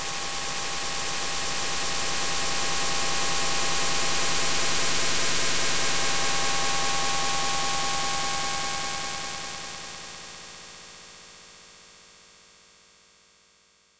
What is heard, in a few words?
grating